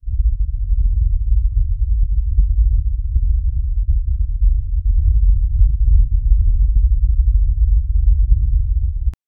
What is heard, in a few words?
interior room during earthquake